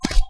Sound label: flesh, footsteps